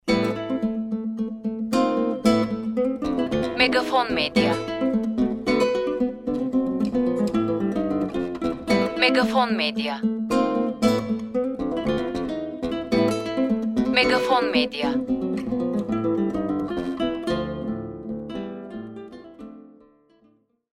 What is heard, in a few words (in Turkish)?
SANTRAL MÜZİK